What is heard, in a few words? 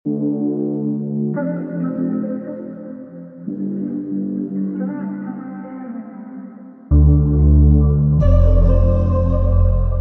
High 140bpm F major